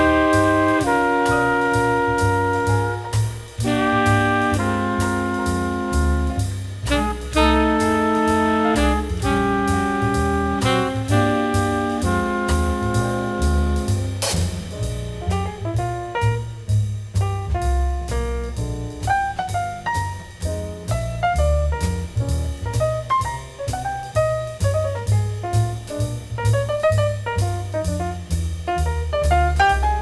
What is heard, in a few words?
jazz